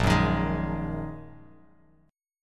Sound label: A sharp 7th Suspended 4th Sharp 5th, down, Bass Standard; strummed; JGuitar